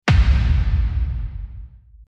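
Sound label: Strong Boom Transition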